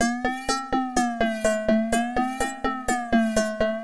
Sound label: XTRA HOUSE 125